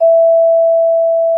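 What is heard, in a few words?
vibraphone E4; Xsample VOL-4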